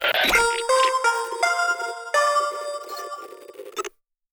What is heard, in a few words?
ringtone